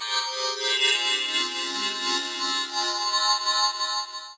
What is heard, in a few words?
Grubby Pad; Zero-G; Soundclash Nu Dance Electro; Acidized Wavs; FX Atmospheres